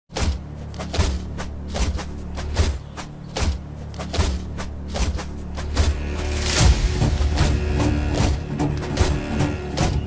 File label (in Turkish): Enstrümantal fon müzik; Mehmed Bir Cihan Fatihi